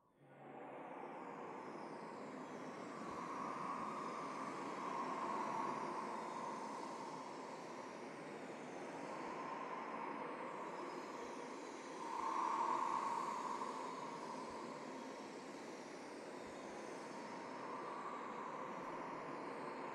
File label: Wind Puzzle with Chimes; Desert SFX